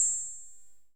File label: hardcore hit